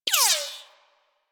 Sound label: Bullet Impact Ricochet; Warframe; Weapons